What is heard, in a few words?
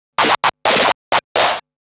Scratch Fills 140BPM